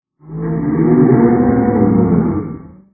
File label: crowd gasp; vapesquared